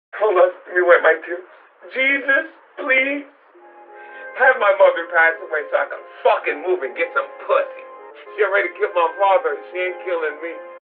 brass

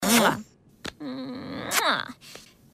mwah

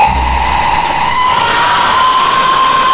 jumpscare sound

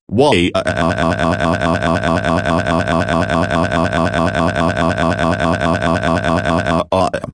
waaaaaaaaaa

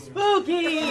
spooky

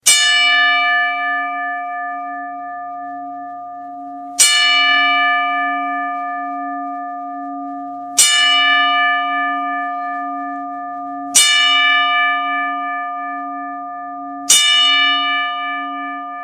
ten bells

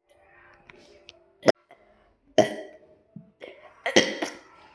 brup

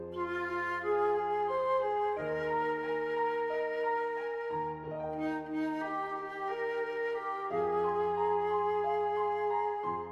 flute solo; sheet music

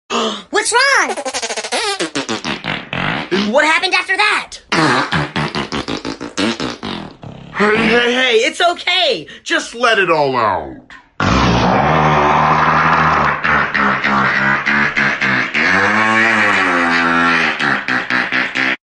whats wrong fart

